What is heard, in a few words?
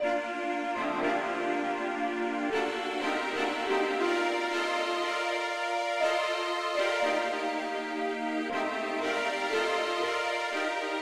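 popuke lead key b flat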